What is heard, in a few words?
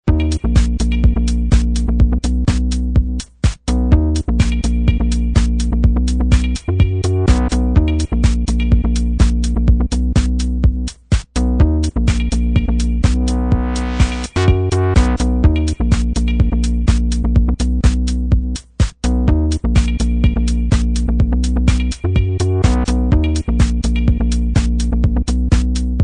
Club Rave Music